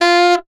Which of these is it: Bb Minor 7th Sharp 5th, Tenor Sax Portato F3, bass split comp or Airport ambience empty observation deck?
Tenor Sax Portato F3